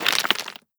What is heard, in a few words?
debris concrete, bullet impact debris